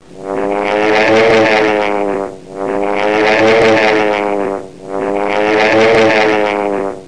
planes

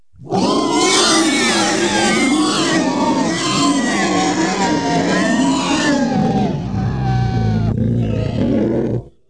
Space Creature Death Scream; Wild and Wacky Sound Software; 1,000 Sounds; Creatures

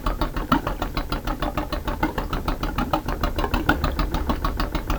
gurgle; Tempra Access; comdex

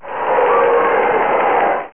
booing; boxing; tokyo; Internet 1996 World Exposition; Panasonic; funcity

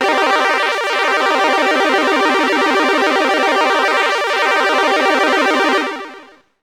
chords long

